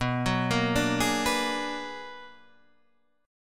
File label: B-Minor Major 13th, Guitar Half Step Down